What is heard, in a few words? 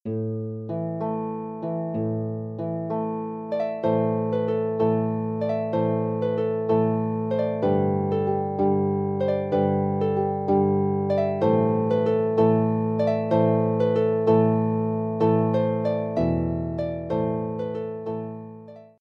Irish Traditional Tunes, march, Harp; Top Ten Irish Traditional Tunes